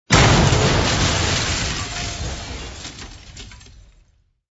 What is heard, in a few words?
building splode; marvel; city under attack